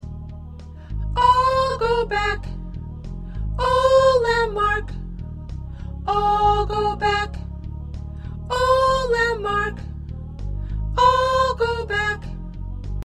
Soprano, choir parts

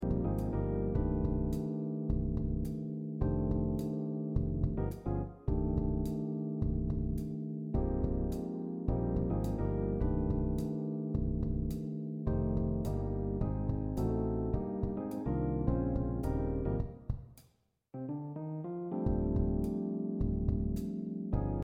Accompaniment

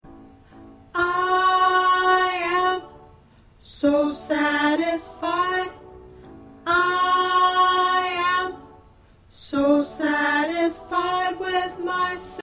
Alto

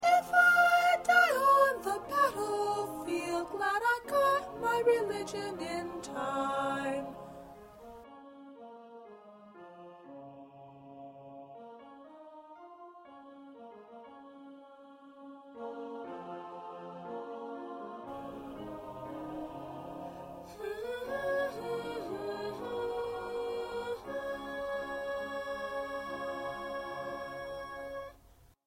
1st Soprano

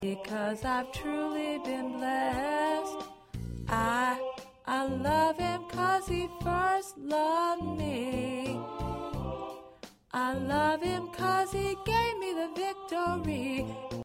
Lead 1

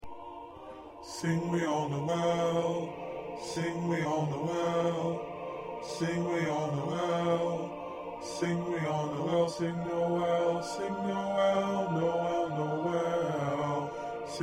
1st Bass

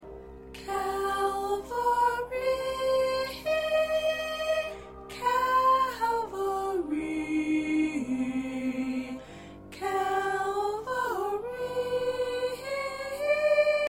Soprano, choir

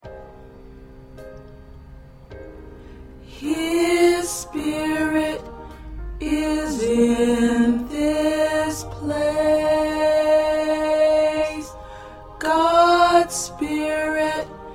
Tenor, choir parts

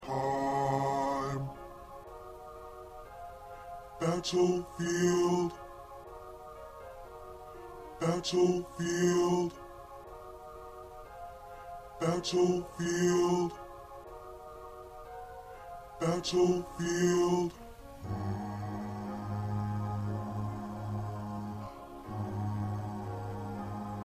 1st Bass

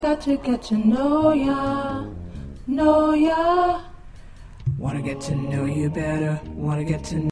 Tenor, choir

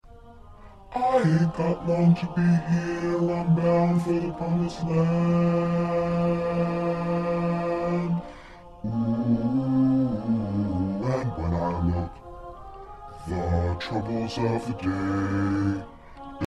Bass

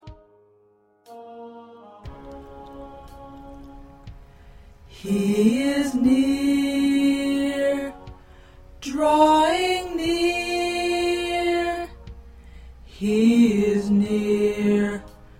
SATB Tenor

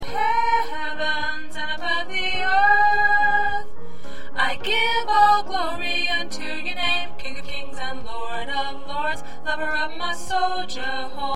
Soprano, choir

